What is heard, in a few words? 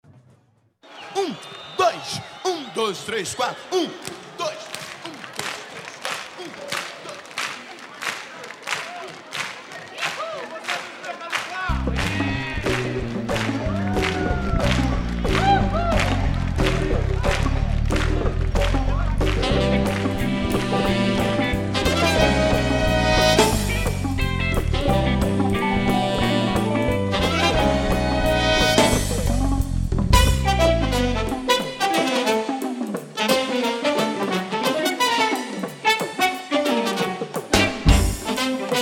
Swing Afro